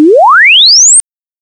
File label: Exp Ship; spacefortress